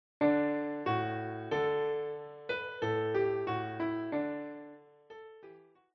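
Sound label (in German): ABC die Katze lief im Schnee, Klavier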